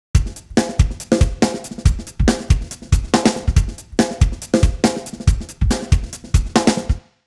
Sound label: drums; apple logic pro percussive; timestretch